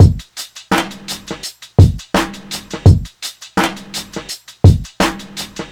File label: 84 bpm high quality breakbeat sample f sharp key; drum loop